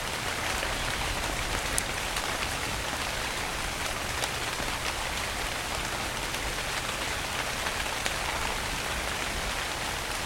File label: Rain Storm Rain Sounds Loopable Rain; Sample Library